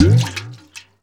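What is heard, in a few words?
WATER BUCKET, percussive; Rarefaction; Poke in The Ear With A Sharp Stick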